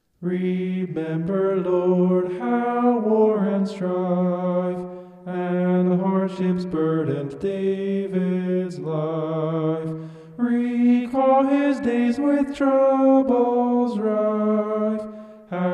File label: vocal homoph; psalm 132